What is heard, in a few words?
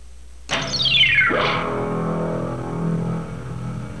stinger